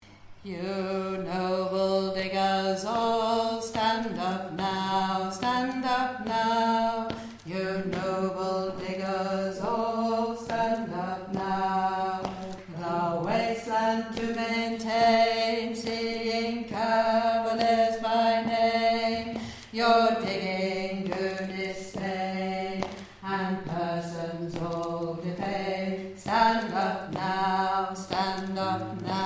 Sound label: Alto